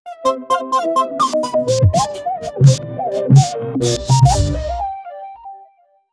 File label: futuristic comedy music w, quirky